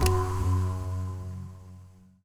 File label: Symbol Stop Button